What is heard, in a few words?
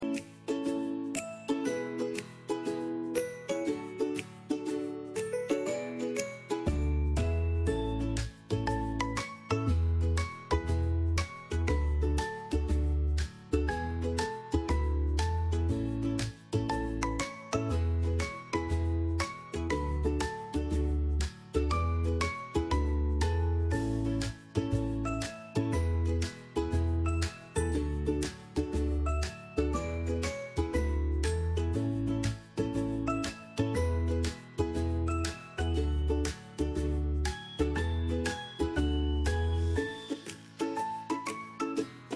ukulele